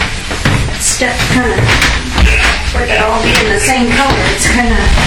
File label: deep male sing song voice; down down; marland st